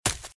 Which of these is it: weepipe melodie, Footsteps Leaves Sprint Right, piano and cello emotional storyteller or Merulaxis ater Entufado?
Footsteps Leaves Sprint Right